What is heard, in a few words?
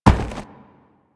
Crewman Footstep Walk; Warframe; Corpus